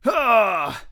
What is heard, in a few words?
death; Escape from Tarkov; usec2